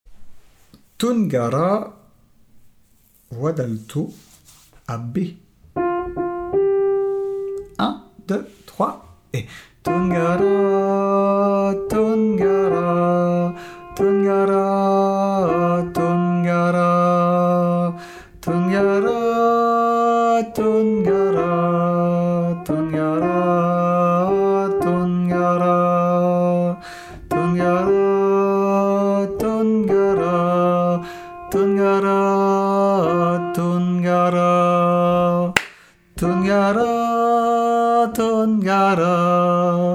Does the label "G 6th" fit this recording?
No